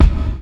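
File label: gated bass drum, Drums Acoustic; USB Soundscan vol.10; AKAI; 90s sample CD; GATEKIT 3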